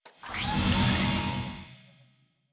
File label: Door, Sci-Fi Sounds